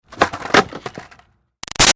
Skateboard Olly Standing; Skateboarding; Sports; Big Room Sound

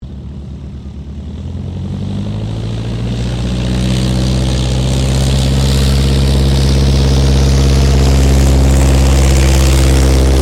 AIRCRAFT AUSTER III TAKEOFF; Airplanes

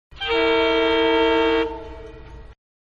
Train-Horn-Single, Distant; Vehicles; Trains; Manhattan Production Music

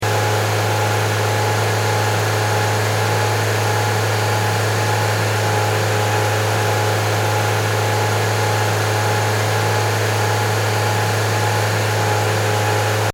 Car Mazda 3 Medium Rpm Loop, Engine Loop; Car 2009 Mazda 3 Medium Rpm Loop; Power Car sounds; Car Sound Effects

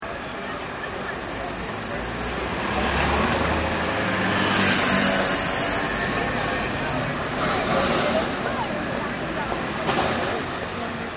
Busy Corner, city; Cities; Partners In Rhyme

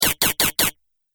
Quad Laser Shots; Laser Gun Sound Effects; Sci-Fi Laser Sound Effect

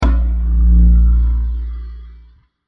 Scary Stab Heavy Mangled; Burst; Impacts; Sound Effects:Impacts; CraftedSignals

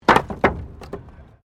Wood Fall

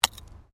Digging Small Handheld Tool Rocks; Various digging tools and processes; Construction

